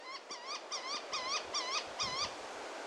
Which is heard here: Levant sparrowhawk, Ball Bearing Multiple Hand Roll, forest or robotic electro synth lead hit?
Levant sparrowhawk